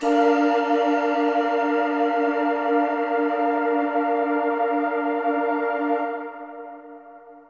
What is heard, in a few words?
synth pad ambient 160 C; Sphere